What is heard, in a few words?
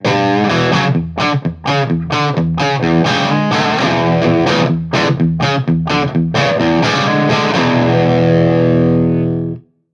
Classic Rock, ringtones; Marshall; London KB 1501